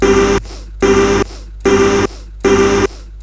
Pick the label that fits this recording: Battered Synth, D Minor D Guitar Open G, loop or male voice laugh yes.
loop